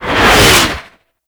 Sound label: Tank Cannon Projectile Whiz; Enemies; Weapons; Lasrian Tank; Warframe